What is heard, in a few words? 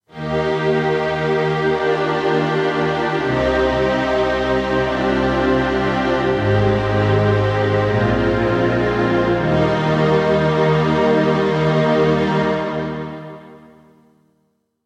strings